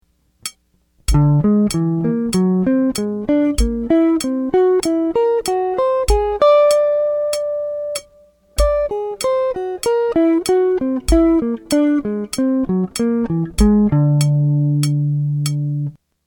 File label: Harmonic Minor Example, general guitar